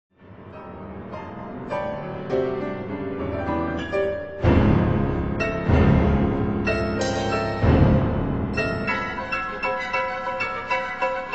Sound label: piano concerto